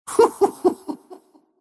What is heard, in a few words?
arc laugh; Rubick